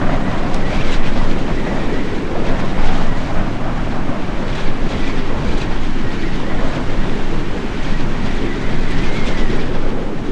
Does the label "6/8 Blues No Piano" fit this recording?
No